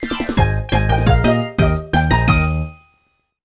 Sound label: Mg Win